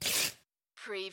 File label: paper rip